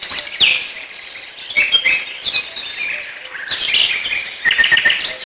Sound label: birds singing